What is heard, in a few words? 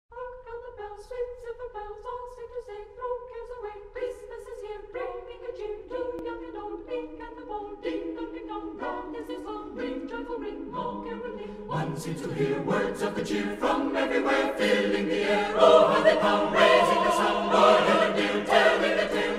ring christmas bells